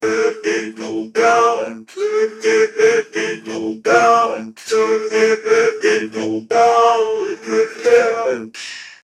those old house vocals; black

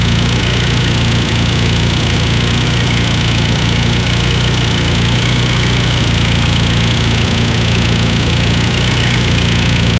ford mustang engine sound

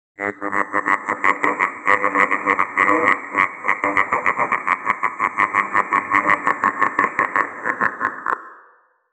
pixel 16 bit sound of an

